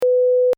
dash, morse

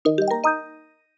success level

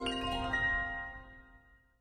pickup crystal

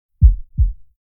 heartbeat

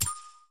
big button green; plinko

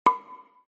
keno draw num

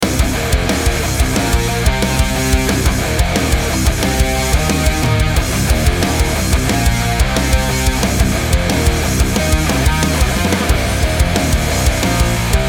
energy metal 90 bpm short

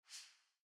Zoom hover

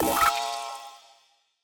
Count Down

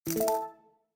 case battle outcome

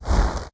Snow step

